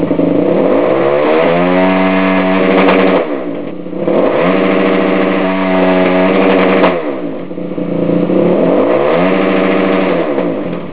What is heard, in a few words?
Without Rev Box F#